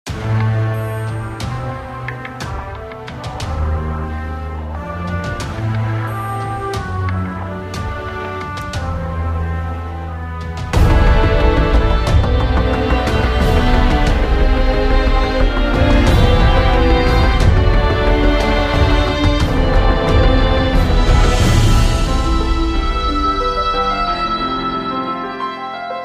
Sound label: Battle BGM